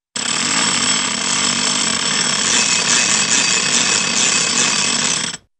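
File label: Mechanical Ratchet with metallic Gear Clicks & Clanks, cartoon; Hollywood Edge; Cartoon Trax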